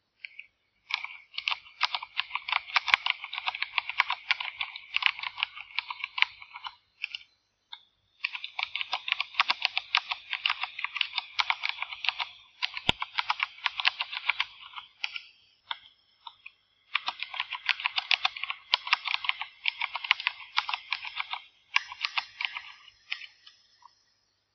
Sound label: MINILA Keyweight UP; RED